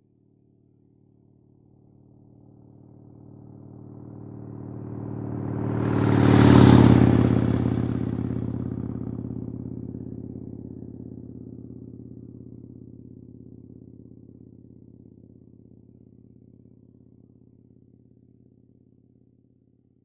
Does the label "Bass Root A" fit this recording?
No